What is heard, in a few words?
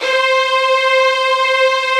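HALL STRING; Greytsounds; Scott Peers Sound Engineering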